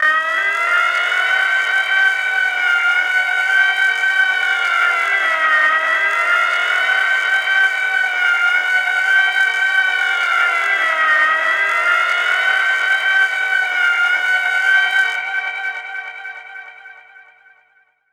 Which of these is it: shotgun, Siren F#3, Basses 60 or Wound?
Siren F#3